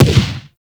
Shrapnel Shotgun Fire; Warframe; Railjack; RailJack Weapons

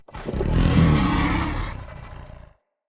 Corrupted Battle Dog Yawn, Animals, Vocals

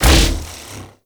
Contamination Wave Proj Impact; Warframe; Focus; Madurai

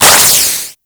Flashbang Ignite; Grineer Death Squad

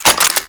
Reload; Warframe; Kraken

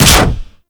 Upper Cut; Ash Storm; Warframe; Ninja

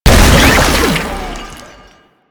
Volatile Runner Explosion; Enemies; Abilities; Proto Infested Volatile Runner; Warframe

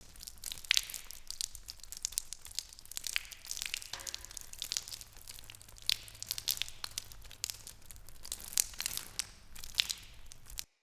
Cave Water Drip Loop

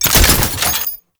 Medic Shield Drone Start; Abilities; Warframe; Tau; Dax Medic

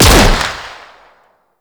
Scaldra Pistol Shot

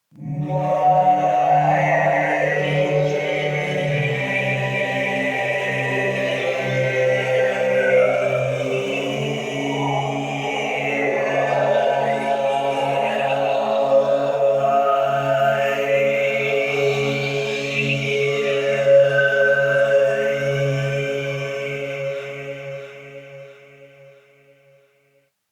Choir; Warframe; Sentients

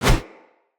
Husk Whoosh, Unarmed; Weapons; Enemy; Mockery Husk

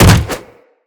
Jump Attack, Footsteps; Wall Run; Characters; Tenno

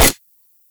Fire Mech; Warframe; Latron Prime

